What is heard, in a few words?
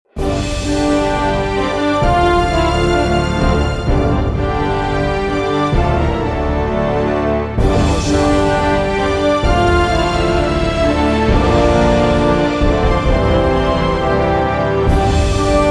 Happy Majestic Epic Power Ending